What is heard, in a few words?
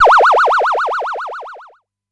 Analogue Alarm, techno drum fx; Single Hits